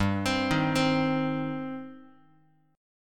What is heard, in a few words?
G Suspended 4th Sharp 5th, Guitar; Gsus4#5 arpeggiated; JGuitar